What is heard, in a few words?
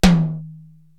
Tom Hi, Korg TR-Rack